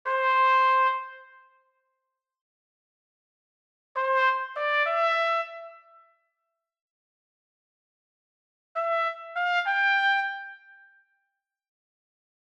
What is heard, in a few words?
trumpet break